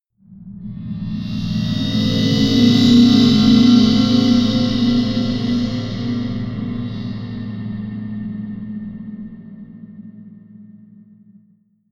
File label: whoosh metallic rumble